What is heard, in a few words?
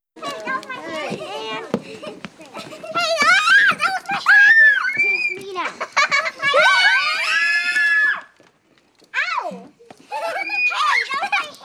Playing Talking Children; Vocals & Wallas